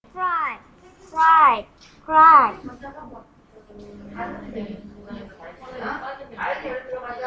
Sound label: cry